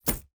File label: environment object drop moneybag